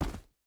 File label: footstep rubber boots dirt jump land; Rust